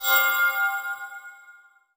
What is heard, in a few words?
magic shinny high tone